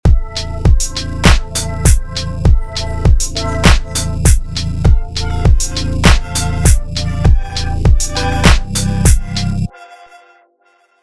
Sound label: lfo plus auto; music study week